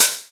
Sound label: Hat; M8; Electronisounds; Vibration Expedition One Shots